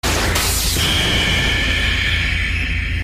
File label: attack